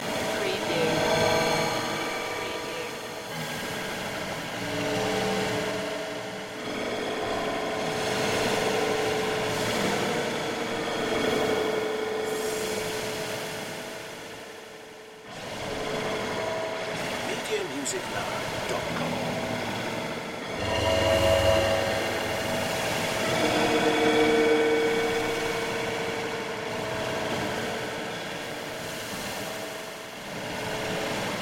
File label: abstract information waves loop